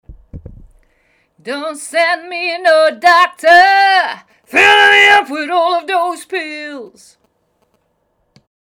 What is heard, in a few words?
Growl